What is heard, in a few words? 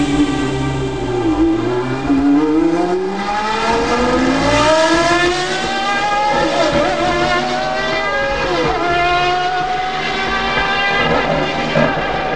g20 turbo